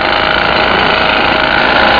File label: jackhammer